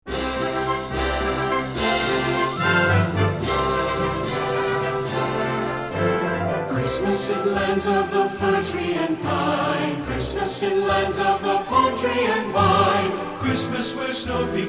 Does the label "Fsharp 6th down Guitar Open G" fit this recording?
No